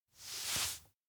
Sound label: hand offer; awayuki; anemoi パッケージ版 1.1